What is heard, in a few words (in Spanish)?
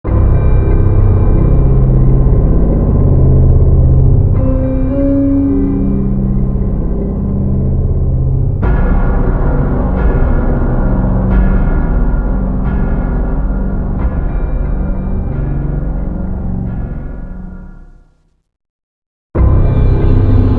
ruidos reloj; left4dead